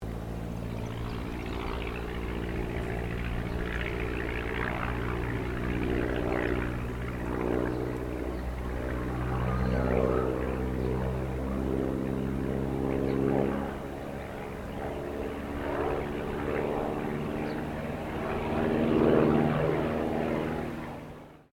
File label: AIRCRAFT RYAN STM-S2 PASS DISTANT; 1939 Ryan STM; Airplanes; Vehicles